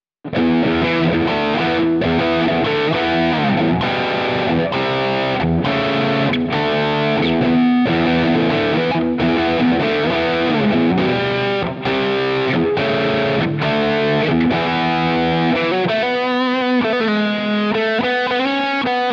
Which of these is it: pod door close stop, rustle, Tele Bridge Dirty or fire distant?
Tele Bridge Dirty